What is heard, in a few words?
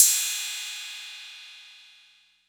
Cymbal 808; One Shots; Drums; Ableton Live 12 Lite; Core Library